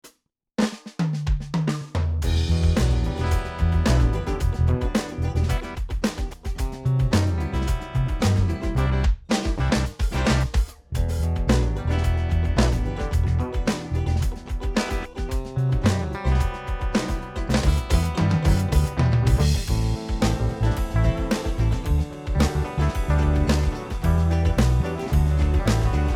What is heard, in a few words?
DRY MIX, GTR